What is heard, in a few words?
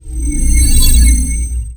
unicorn fade